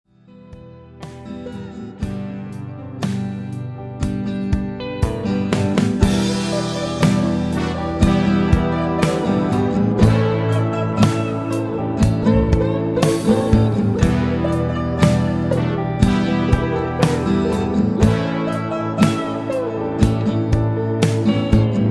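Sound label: without Bass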